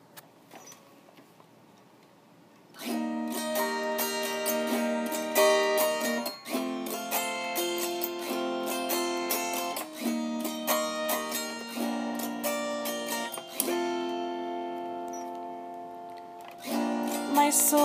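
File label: [F] Original Song [autoharp][a little love song]; songwriting; Your Home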